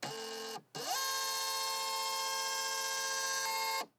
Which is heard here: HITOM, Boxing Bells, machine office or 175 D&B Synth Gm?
machine office